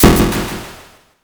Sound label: thunder magic